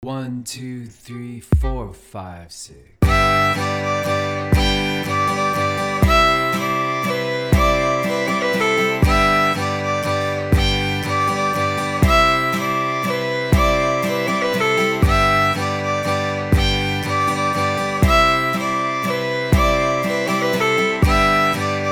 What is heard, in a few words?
Jig Variation, fiddle; kesh